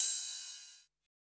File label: Cymbal